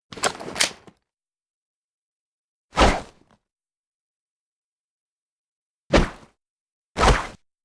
pose

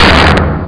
drama pound

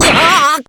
shock; hibiki

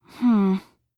hmm

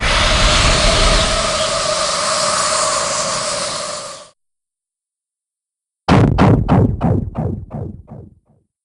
breakdown; huang

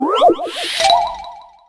incoming puzzle

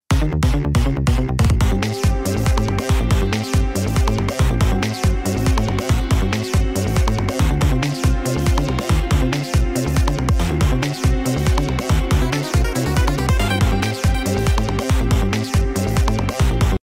countdown (arranged)

